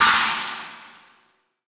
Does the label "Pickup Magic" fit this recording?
Yes